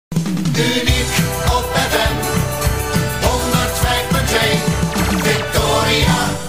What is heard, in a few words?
jingle; Victoria Halle